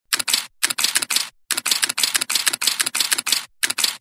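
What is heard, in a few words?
camera shutter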